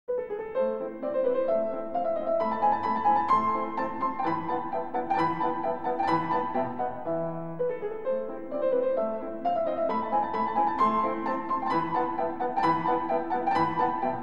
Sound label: Piano Sonata in A Major, Alla turca; Piano Sonata No. 11